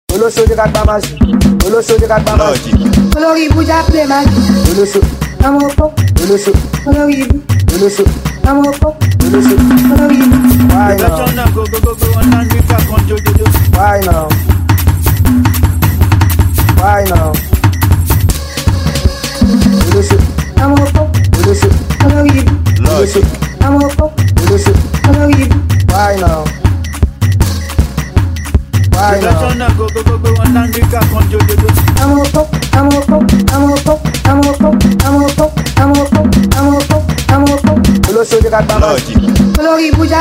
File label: Professional Beat